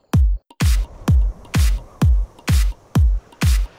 (Beat)

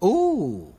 Oooh

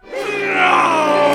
NOOOOO; asdfmovie

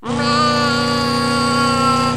Meeeh; asdfmovie

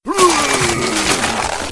Barf; asdfmovie

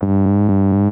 (Angry bees)

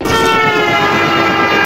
Train; asdfmovie

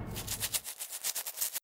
(Scratching)

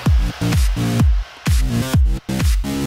Beat

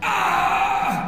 AAGGHH

